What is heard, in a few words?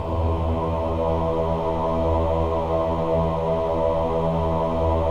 MEN AH, Choir of Ahs; Roland - LA Composer vol. 1